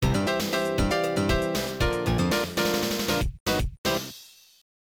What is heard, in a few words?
Near Pin Prize; Nassau Game Hole 3; Top Players Golf; Neo Geo